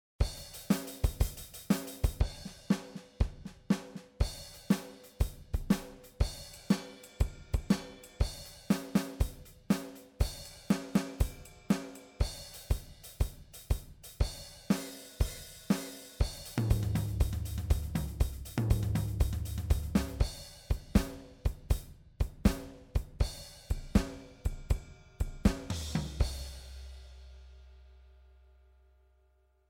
Drummer Blues Pattern Stereo Out; MOOER Drummer

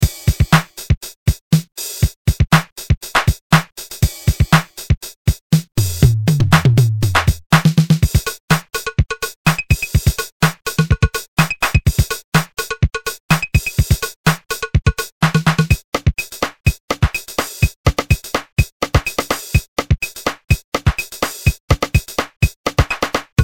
Drum raw

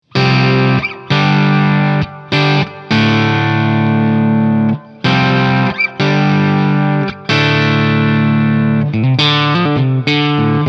Crunch EF86 Gain

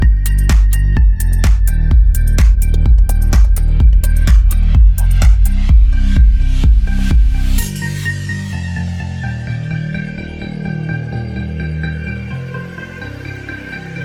Modulation Flanger

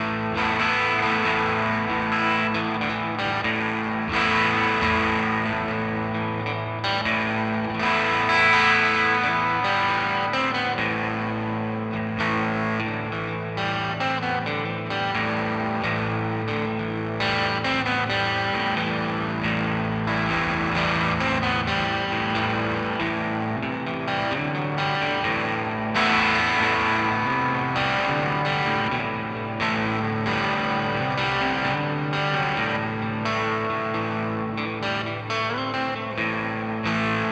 Nux MG-30 Deluxe Reverb

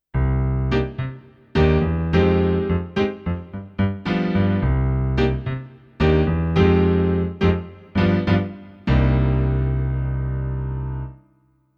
Startone MK 400 Grand Piano

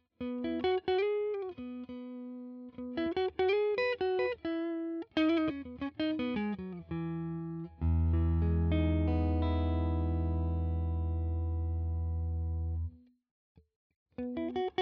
JA 60 CC all positions clean Melody

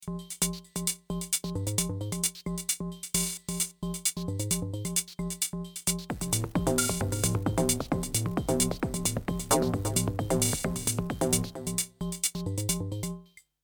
digitone dry

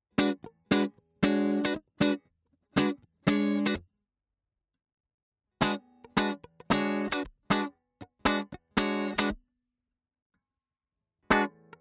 Cort MBM 2H clean All positions Funk

